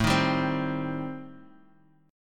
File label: A Minor 7th Flat 5th, Guitar Open D